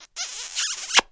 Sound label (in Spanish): beso; cstrike; kz eventos